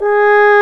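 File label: brass, Trombone; Roland L-CDX-03 Disk 2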